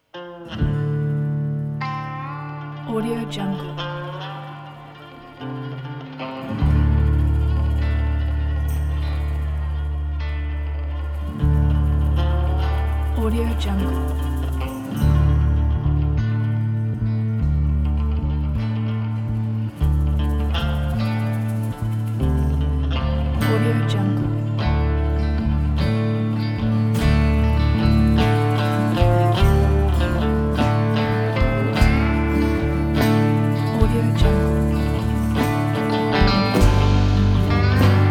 western country

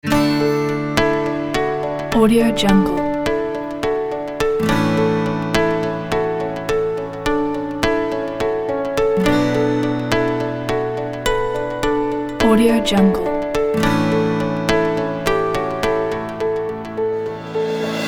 uptempo inspirational tune; loopslab

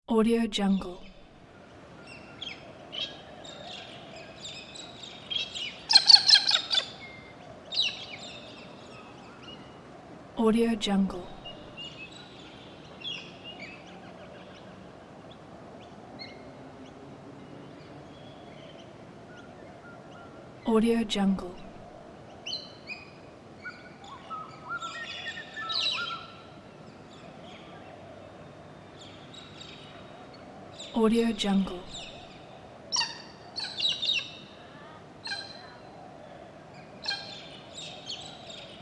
morning in forest

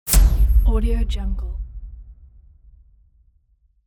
cinematic smash hit

